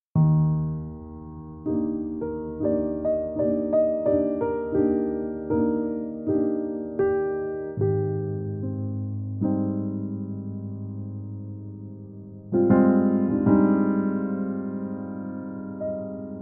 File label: Jazz Instrumental Chill